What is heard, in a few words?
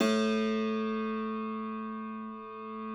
Thumb Tack Piano A0; ff -ped; Total Piano